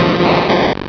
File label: cry; source of the sovereign